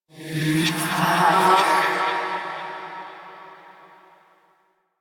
Hand Compass Vocal; Warframe; Duviri

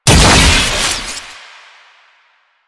Explosive Impact, Weapons; Warframe; Acceltra Prime

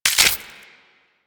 Modular Clip Small Mech, Weapons; Warframe; SU Modular Guns

